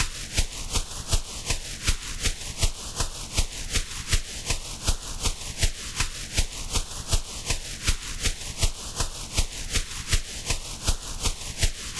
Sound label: phased metal, drum loops